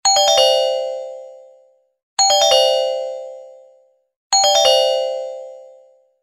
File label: sms sound